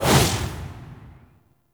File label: Operator Focus Cloak Blind Burst; Warframe; Operators; Attack